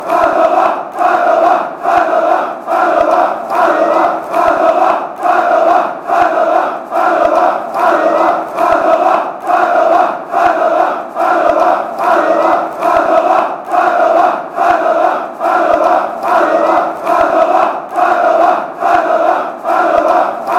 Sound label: claps, chants; padova